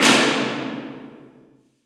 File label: Hallway; Graffiti; Impulse Response; EchoThief Impulse Response Library